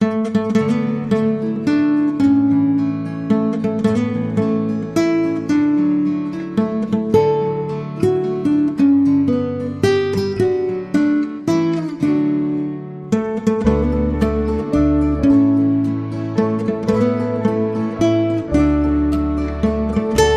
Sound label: Nylon Guitars and Piano Happy Birthday